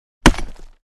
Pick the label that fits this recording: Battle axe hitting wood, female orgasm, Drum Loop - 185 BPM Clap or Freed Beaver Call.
Battle axe hitting wood